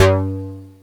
POWER, BASS; East West; Global Sounds

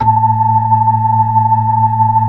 B-3 Hollow; Keyboards of The 60's and 70's

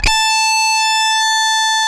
Dirty Lead, DISTORTION; Sound & Vision; Gigapack I CD 2; GUI E-DISTORTION

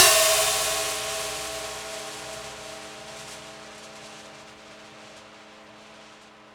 Prepared Drums

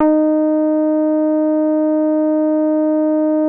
D#5, Bass; E-MU Producer Series Vol. 6 – Analogia Project (CD 1); Boom da Bass 2

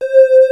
MATRIX PAD; E-MU Formula 4000 Series Vol. 5; Protozoa

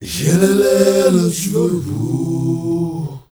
MALE GRP, CHOIRS; Spectrasonics; Heart of Africa

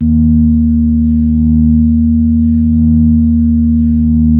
EB2S, B3

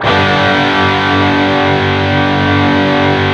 PWR CHRD G#2, Guitars; PRS

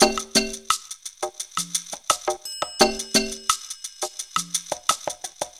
PERC LP, PERC LOOPS; Zero-G; Funky Elements

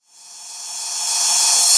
Reverse Cym, Cymbals

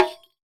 Rek Rim, Percussion; Roland BK-5

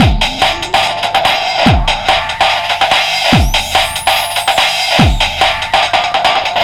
144.3 bpm; 90 s Sample CDs; Planet of the Breaks

